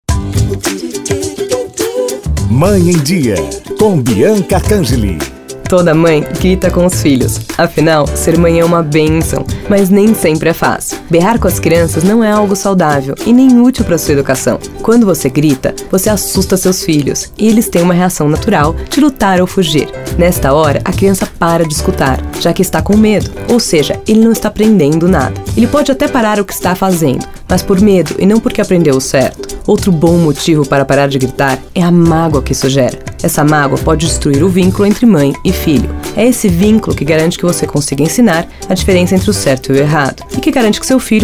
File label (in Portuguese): GRITOS